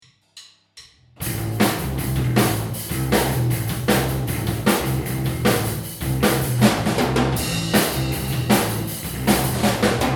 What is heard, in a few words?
drums bass